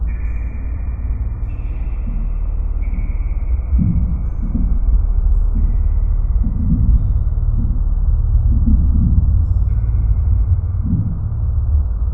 Dark Interior; Duke Nukem Forever

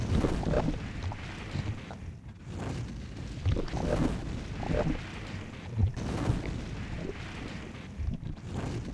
lava bubbling; GrandFantasia

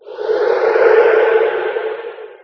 apc move start; Emperor - Battle for Dune

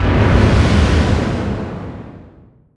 distance ship miss small effect; Hearts of Iron IV Death or Dishonor

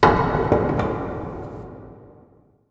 mall random; Left 4 Dead 2

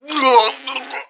normal dying; Emperor - Battle for Dune

